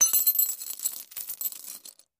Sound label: Med Chain Lowered